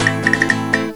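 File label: flamenco guitar; happy Cinco de Mayo!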